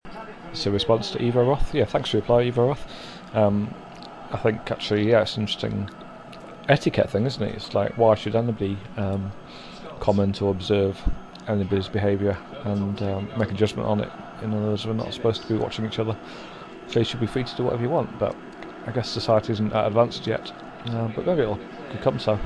re public booing

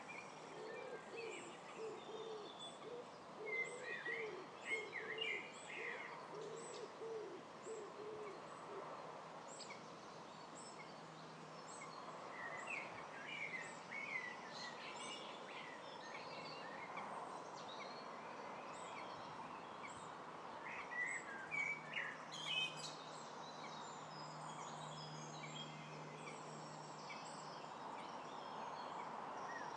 birdsong on kennet and avon canal

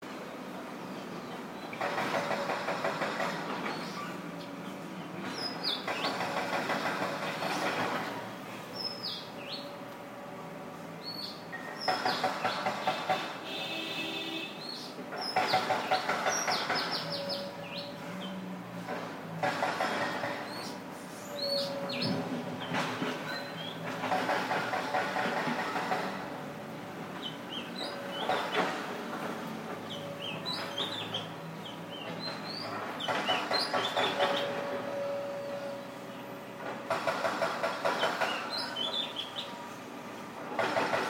hammering in bangkok

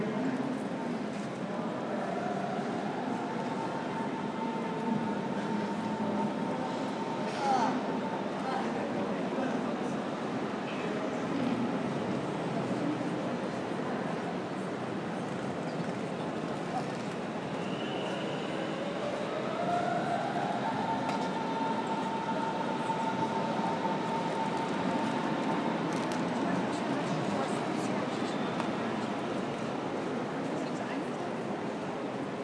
berlin train station ambience; hbf

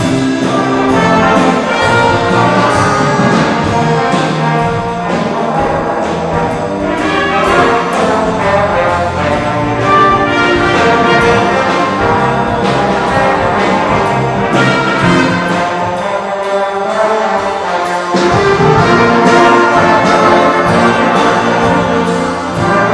jazz orchestra rehearsing; for tonight's concert

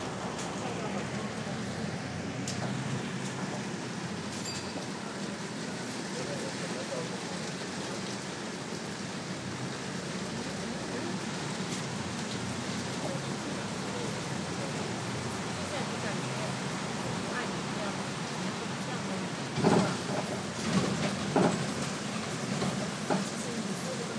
bus in very heavy rain; soundsofsummer

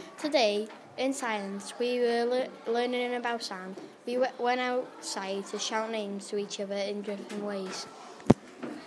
shouts

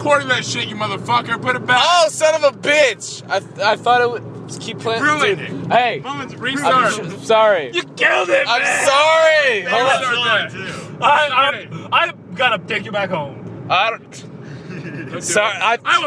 car

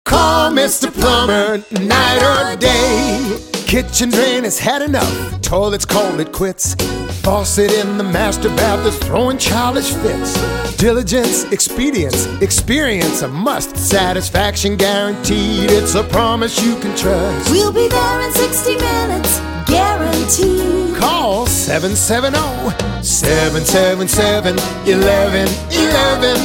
jingle; mr plumber

